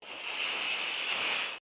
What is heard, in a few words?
Snake Hiss Single Shot; Animals